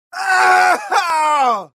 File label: scream, male; gmod; npc